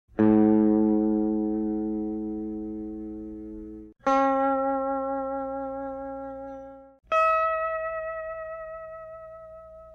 Cstlguit, mellotron

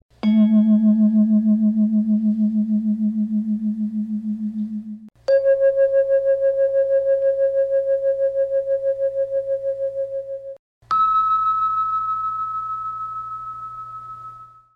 cvibes, mellotron

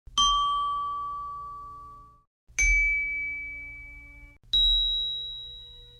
c bells

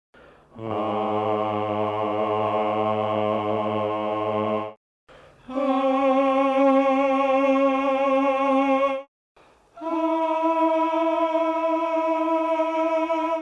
males

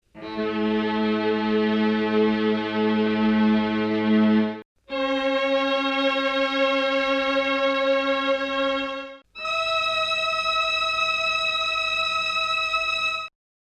strings, mellotron

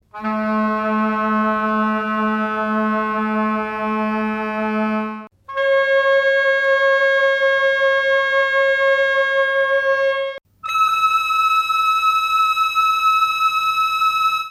woods, Mellotron

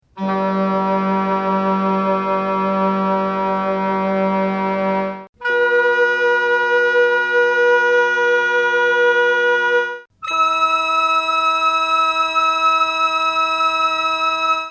woods, Mellotron

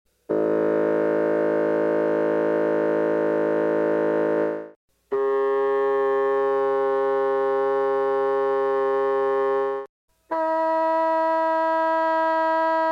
bassoon, mellotron

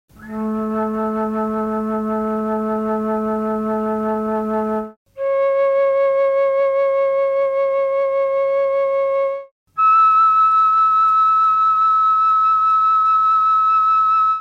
flute, mellotron

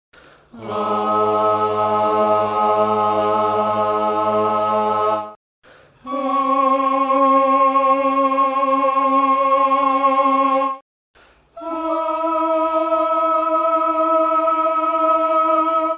8 voice

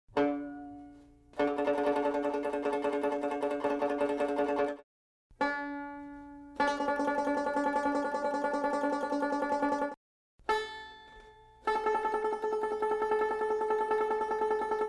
cbanjo, mellotron

